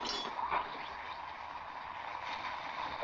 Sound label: boiling sound